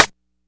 airy steel snare drum d key

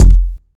focused kick sound f key

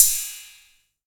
crash cymbal drum sample d sharp key

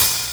treble heavy open high hat e key; Duration 0:01; Samplerate 44100 Hz; Channels Stereo

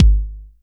tr 909 electronic kick drum g sharp key

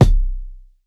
tight lows bass drum sound C key

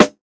thick snare g key; snare drum samples; drum single hits one shots

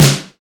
clear steel snare drum sample c sharp key

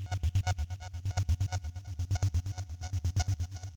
running synth gated f sharp m; bass; loops

